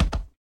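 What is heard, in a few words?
free spins wheel stop; dazzle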